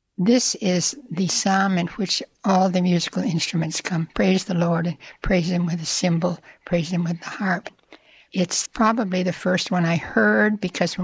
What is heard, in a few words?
hymn